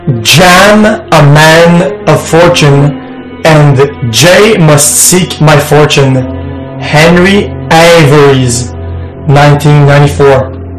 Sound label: loud